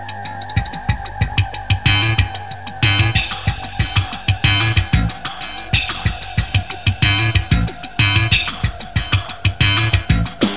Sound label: BG MUSIC; svencoop